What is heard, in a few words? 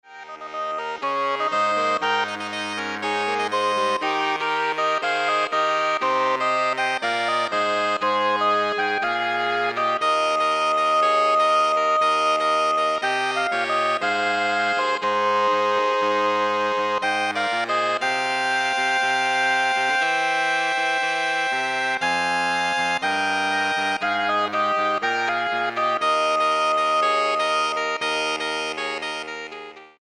Saxophone Ensemble